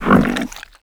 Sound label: catchy lick cast; weapons; dota; largo